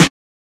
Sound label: Snares